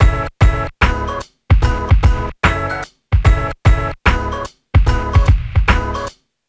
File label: Bpm74 Int L; Fu-Ten